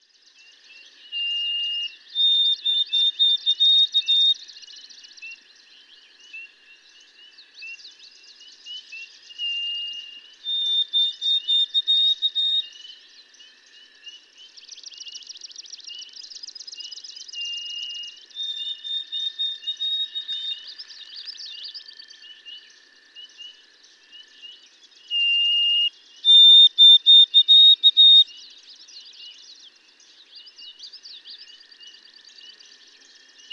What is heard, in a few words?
White-throated Sparrow